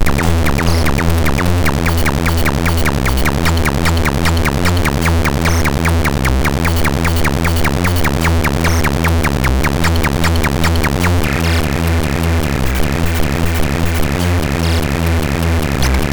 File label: Tekkno Power, MOS6581R3; hvsc